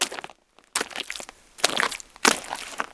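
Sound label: footsteps; Mafie 1 cz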